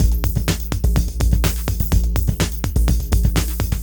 DEEP ELECTRO ACOUSTIC GROOV, PROCESSED ACOUSTIC DRUM KIT; Sonic Foundry (Sony Creative Software); Vir Unis Infusion